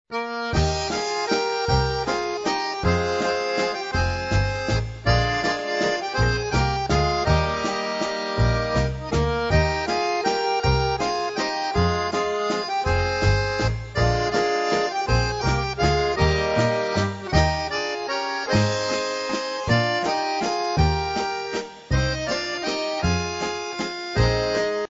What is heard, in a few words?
waltz